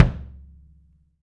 FELT KICK; 3D Sound; 3D Drums

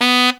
TENOR B; Zero-G; Phantom Horns

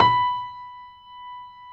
GRAND PNO B5

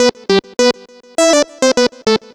Synth Lite; Sonic Foundry (Sony Creative Software) - Rondo Brothers Trip-Hop Loops & Samples; Liberation

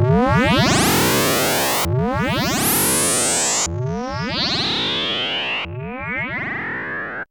GROWL

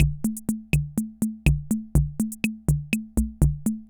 Ace Tone Rhythm Fever Loop

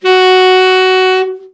TEN SFT, SAX; Best Service Gigapack 1; BR SAX 3

